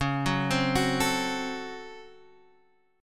C sharp Major 7th Suspended 4th Sharp 5th, Guitar, Open F; arpeggiated; 13,13,12,13,12,x